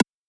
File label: perc; Anthemic.Electro; drum hits